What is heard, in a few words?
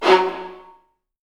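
viola marcato F#2 left, Virtuoso Strings; ILIO; Kirk Hunter Virtuoso Strings VOL-2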